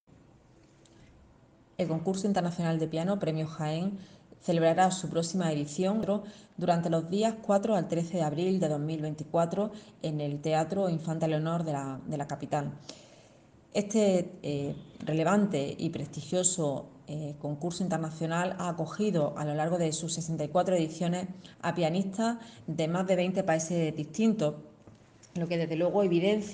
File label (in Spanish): Piano; concurso Premio Piano Jaén